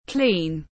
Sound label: Clean